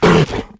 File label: Monster Effort Big; Monster Ralph Effort Big